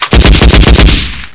mp5, weapons

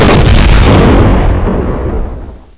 nuke blast; ambience; caged

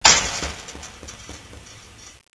button; sven; aomdc

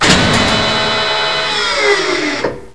lift; sven; caged

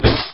step; sven; gonome hev

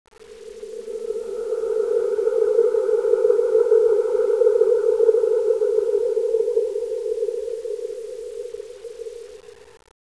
horror music; aomdc

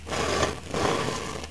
push box; debris; aomdc

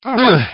pain, construction

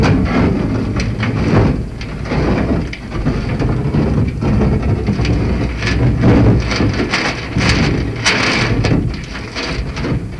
scuffle; ambience; caged

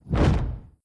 step; sven; bull

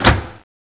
switch; sven; caged

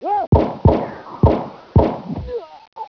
setup; ambience

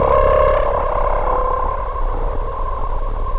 big warning; ambience

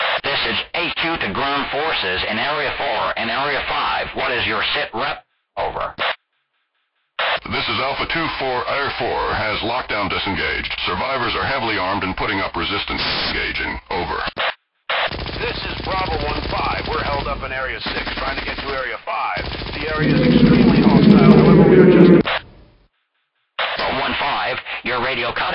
radio

